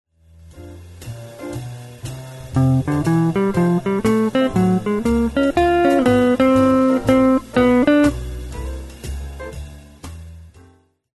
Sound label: guitar jazz blues; wes montgomery guitar jazz blues lesson